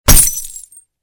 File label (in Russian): zvuk bjushhegosja stekla ot vystrela